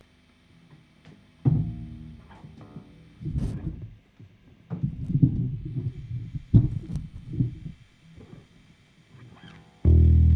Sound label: bass; Gitarre; improvisation01